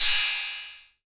Royality free crash cymbal drum sample tuned to the D# note; D sharp key